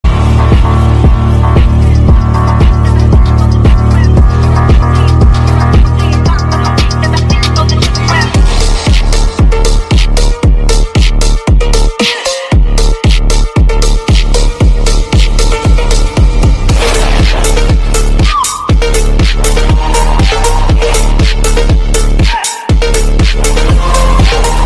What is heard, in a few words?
phonk ringtone